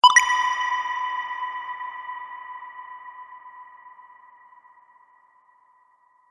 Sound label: Game Cavern Tokens; Video Game Sound Effects